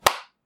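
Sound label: applause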